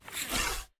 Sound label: Antihero Skill Foley; portal quest android